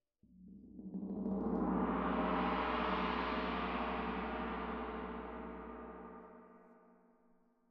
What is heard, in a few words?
Tam-Tam Close